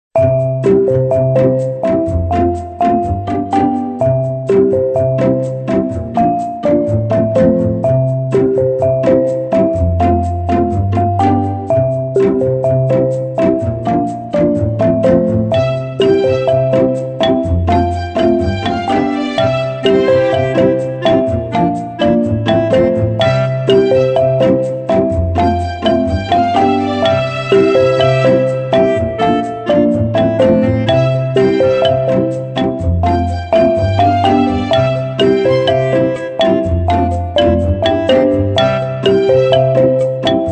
Música de Fondo para Apps y Videojuegos

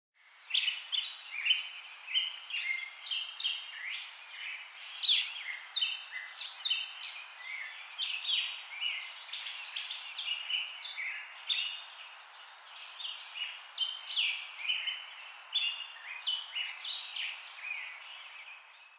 Canto de un pájaro, Bird singing Sound; Efecto de Sonido

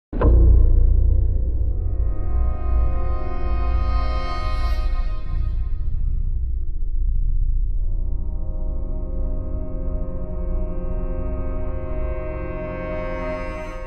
Suspenso Cinematografico Instrumental

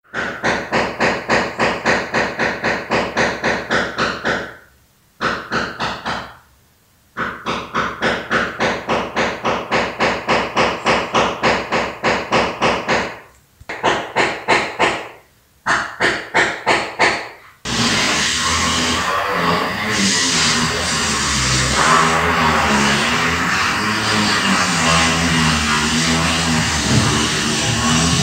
Obra vecinos Martillo y Taladro

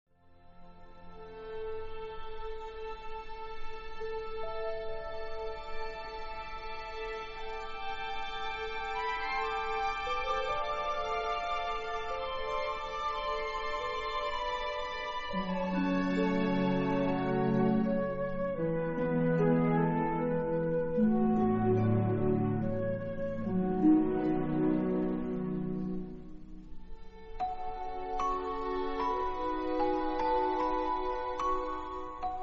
FONDO MUSICAL

